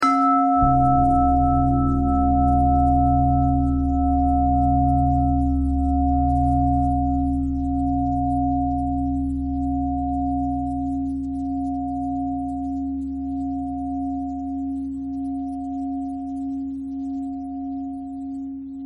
bell bell thread simple, bells

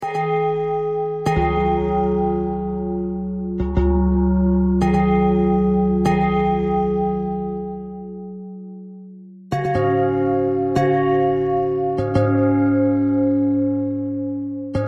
bell thread pentatonic

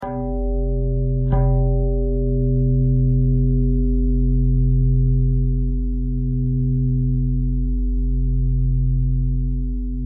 bell thread pentatonic

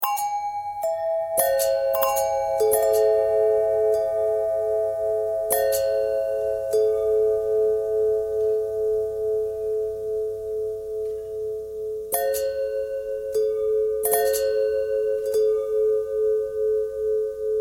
long bell long bell thread pentatonic, bells

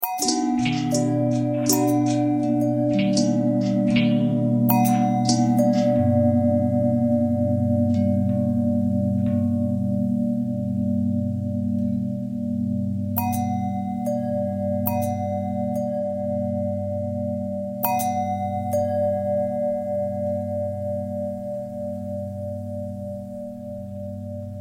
long bell thread pentatonic, bells

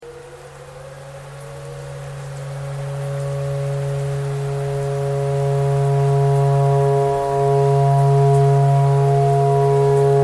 clarinet tornado siren thread pentatonic

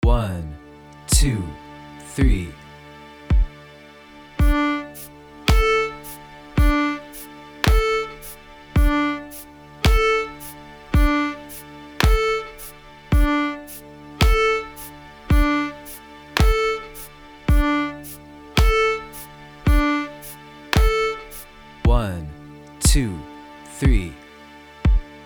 staccato

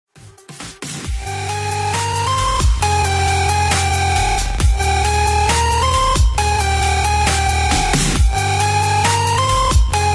Thunderstorm

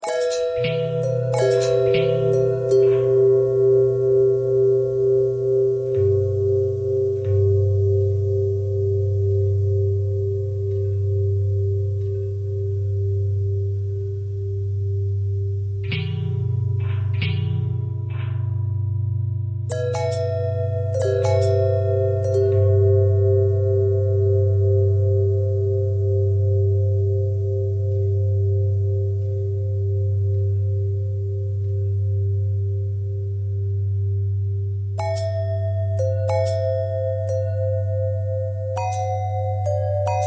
long bell thread simple

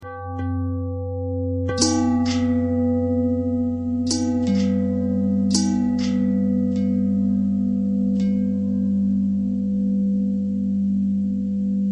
longbell bell bendthread; bells

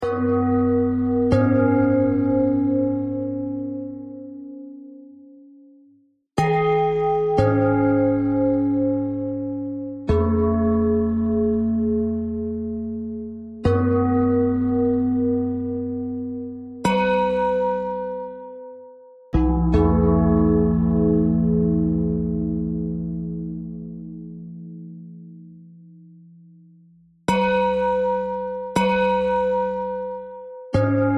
bell bell thread noise; bells

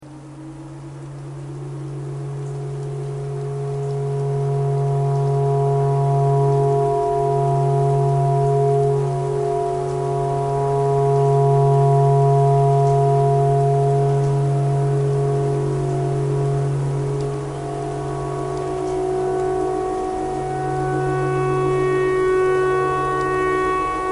clarinet tornado siren thread pentatonic

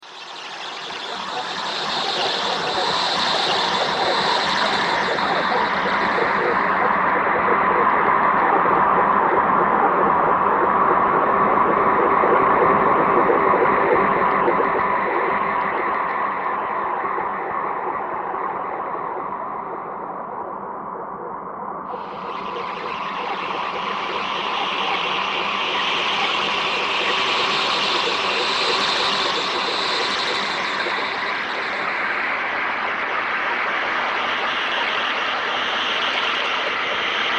weatherradio thread noise all

